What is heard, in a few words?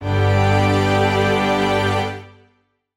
voicings examples